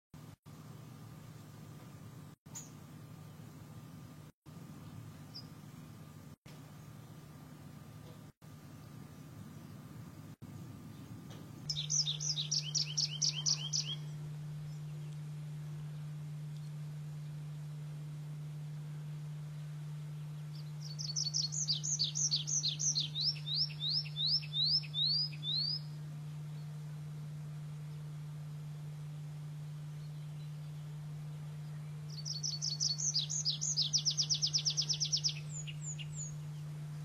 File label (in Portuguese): canto da petinha das arvores